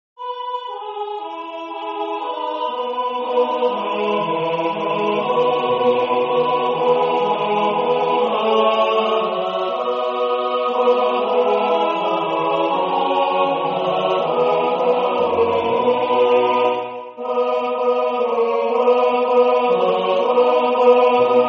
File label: Chorus Tutti; H.Purcell